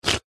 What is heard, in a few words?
human mouth slurp lips; zapsplat